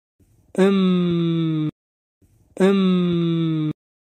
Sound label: Ammm